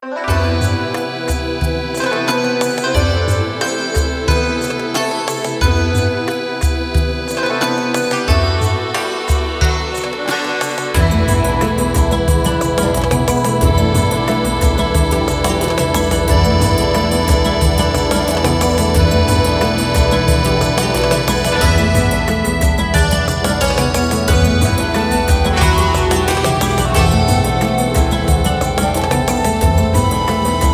bgm town; palcifal

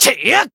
attack, jp; nero

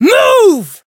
Voice attack; minalca

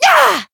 Voice attack

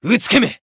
Voice attack; shigure

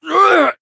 attack

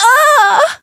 damaged; lele

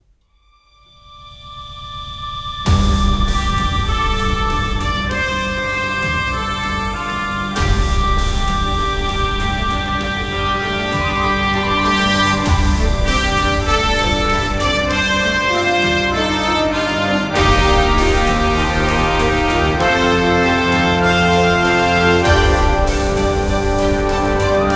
Bgm town steam; omegapolice main

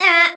damaged

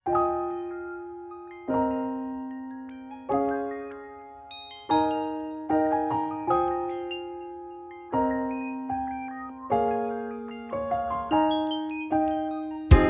bgm song inst; event lostlab

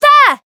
Voice attack; orleya

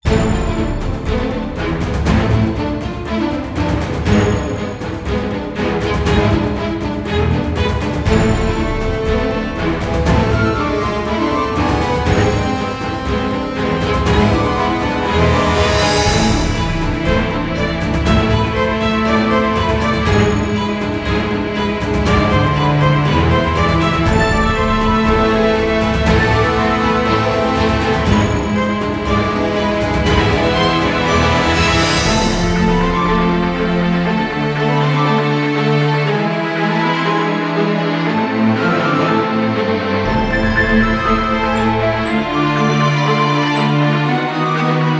dungeon crystal tower; seiho

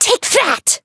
Voice attack; mayu

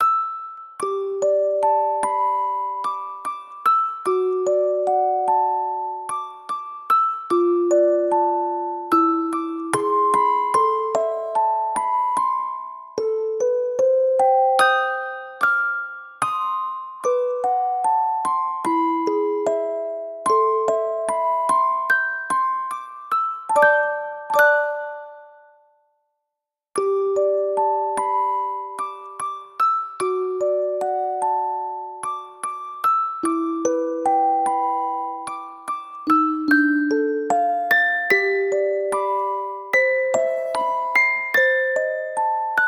main theme musicbox

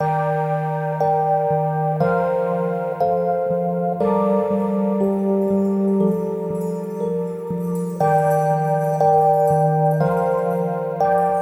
Bgm dungeon dreamy unconscious

